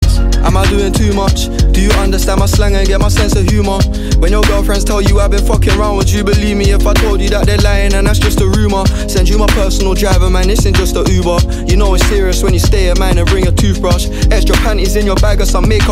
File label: instrumental